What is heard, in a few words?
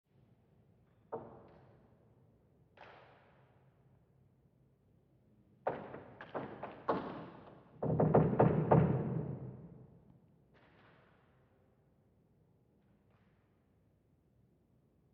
Building Ambient Wood Creaks Eerie Presence; Creaking; Objects; Big Room Sound